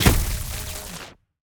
Lightning Tank Footstep; AudioWizards

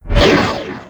Menu Scifi Enter

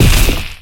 Melee Magical Heavy; Kilta

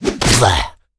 zombie whit; DeadFright

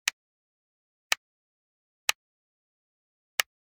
Generic Button Wood Tiny

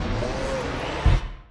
Punch Right Speed Up; Pitch Black - A Detective's Demise

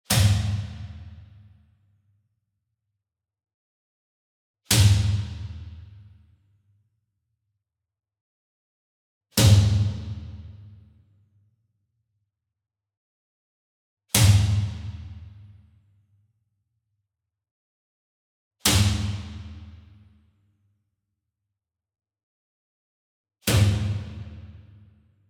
Single Drum Kodo Hit Whip Beater Far; boom library; Cinematic Strikes Construction Kit

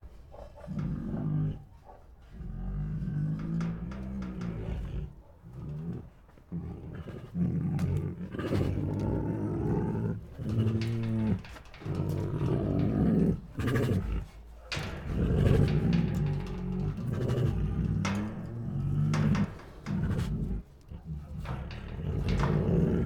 Two Tigers Make Deep Defiant Growls; Sound Ideas

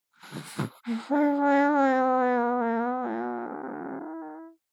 BABIES DREAM Boy 3 months Exhale; Baby Boom

